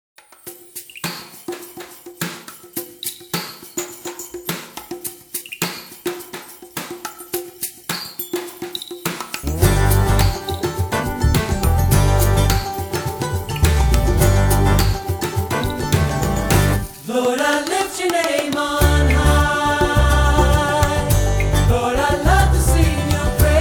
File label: acoustic worship